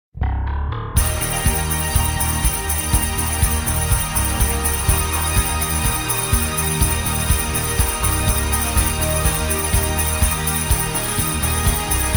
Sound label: (Ab To Bb) No Backing Vocals